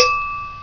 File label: new email; Mondo; Skins; Arctic